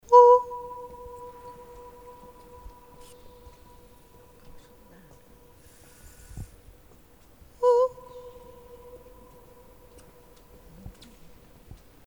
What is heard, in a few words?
echo at St Therese main; Holiday 2001 in France